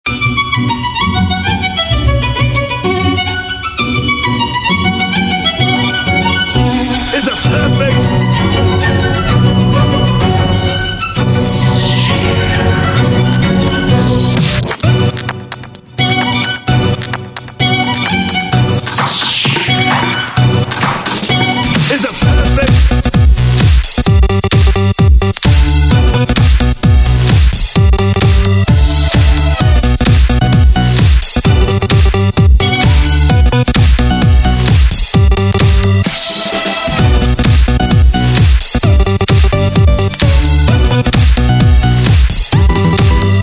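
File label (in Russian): балет и хип-хоп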